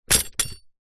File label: animals horse saddle set down ground; zapsplat